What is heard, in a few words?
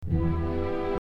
accord